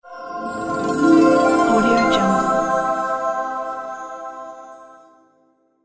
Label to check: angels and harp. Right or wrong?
Right